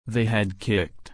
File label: kick